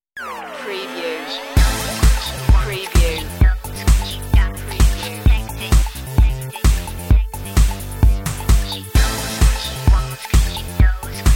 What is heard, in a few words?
vocal version